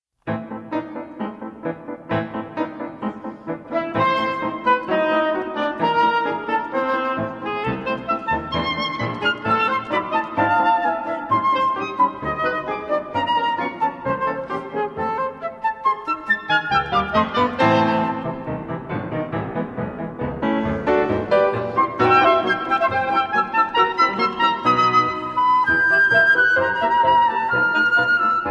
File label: Trio for Flute, Oboe, and Piano Allegro giocoso; III.